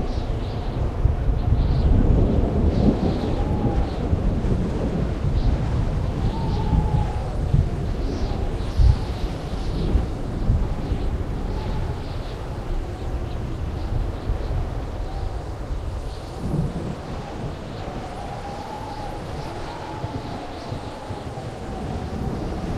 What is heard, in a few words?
Thunder AMBIENT